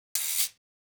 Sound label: Percussion, 100 Ebmin, Hip Hop Exotica